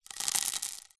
small stones handful drop onto paving stone

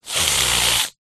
human sniff wet ill

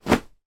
household pillow drop on floor; sound effects one

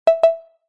notification ui simple alert attention

